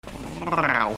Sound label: Grrr short